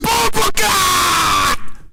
brace yourself the lions roar; shi zi nopao xiao

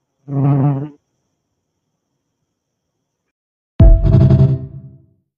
bee sound

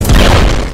astro gun

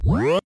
reverse funk; tiktok

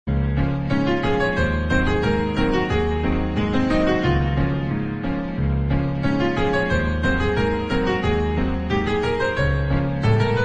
piano version; trolling

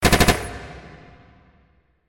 rifle burst fire

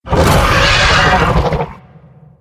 indoraptor

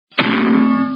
cartoon bonk sound

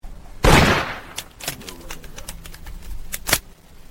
gun shot; murder 4

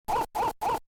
dog bark; duck hunt

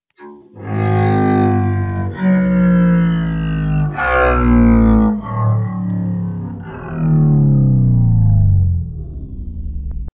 double bass low

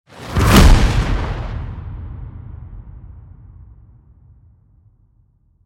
fast thunder impact

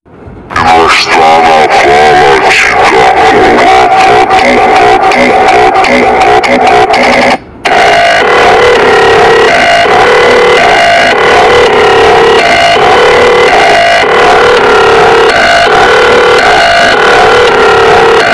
phonk; auriflama

